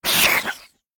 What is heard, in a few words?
biter death; factorio; Factorio 0.14.21; creatures